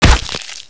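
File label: machete hit body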